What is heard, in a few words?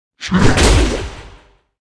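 bee attack, monster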